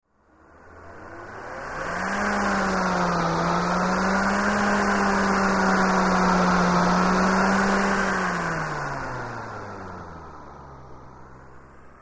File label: Electric Turbine Blower; Whine, Slow On / Run / Down; power on off